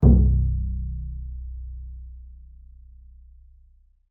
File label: PIBF B0 -R, BASS PIZZ; BASS PIZZ 2; Xsample VOL-5